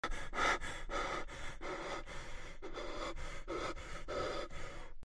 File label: breathing; hello neighbor